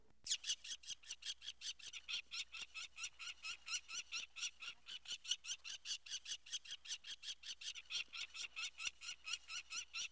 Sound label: peregrine falcon